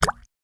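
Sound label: Drip Single Falls Liquid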